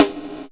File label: Gated Kit, Percussion